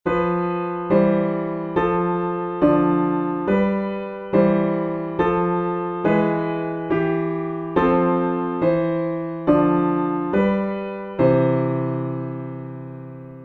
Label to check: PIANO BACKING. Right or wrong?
Right